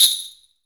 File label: SUZU; AKAI S-Series CD-ROM Sound Library VOL-5